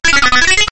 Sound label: Robot talking